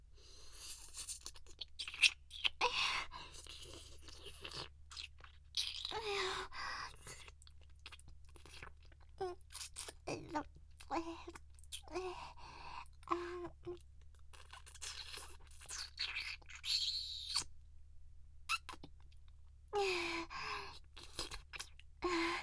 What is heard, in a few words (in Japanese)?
強めに乳首をなめる